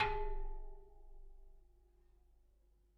Bicycle Spoke

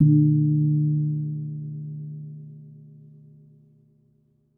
Kempul, Gamelan

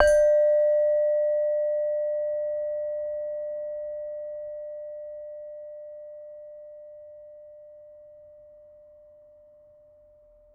Saron B, Gamelan

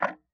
Tick, Clocks Kitchen Clock

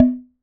Wooden Bar Strike

